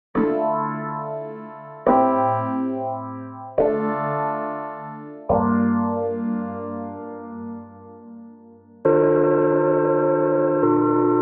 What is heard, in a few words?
Chords Hip Hop Rap